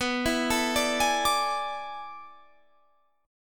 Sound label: A Major 7th Suspended 2nd Suspended 4th